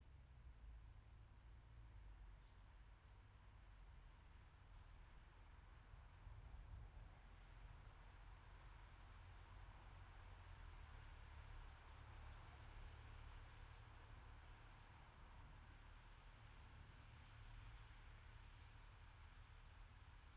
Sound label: yamanakako sound